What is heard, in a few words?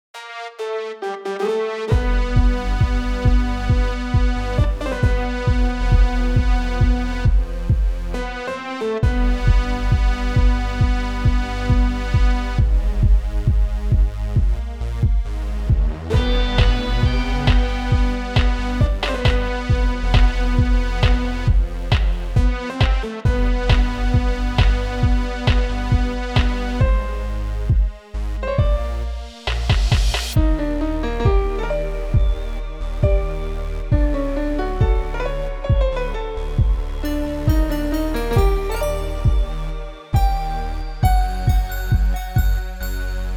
Dive Theme Remix; RSE